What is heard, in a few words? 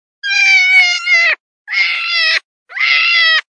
Jackrabbit; Jackrabbit Buffet